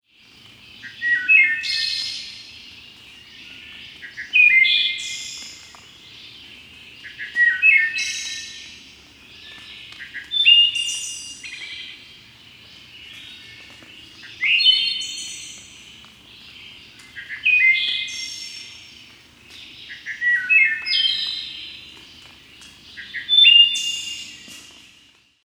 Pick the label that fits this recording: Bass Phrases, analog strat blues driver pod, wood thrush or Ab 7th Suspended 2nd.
wood thrush